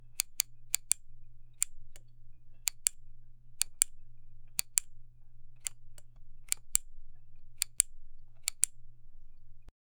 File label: turning on a flash light